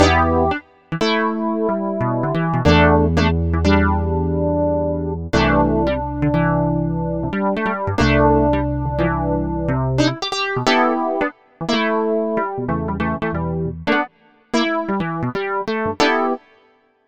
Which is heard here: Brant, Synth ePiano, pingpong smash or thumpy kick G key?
Synth ePiano